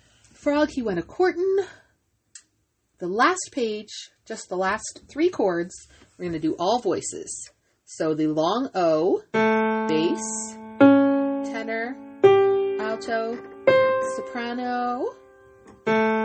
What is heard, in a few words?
frog courtin satb; study tracks